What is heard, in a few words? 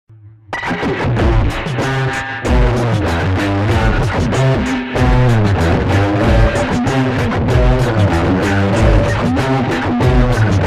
Electronic background music